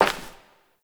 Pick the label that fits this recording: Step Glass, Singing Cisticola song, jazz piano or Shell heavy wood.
Step Glass